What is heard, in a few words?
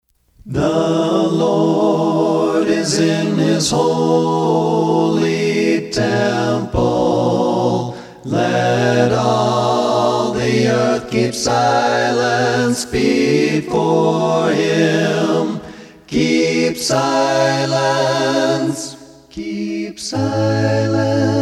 music english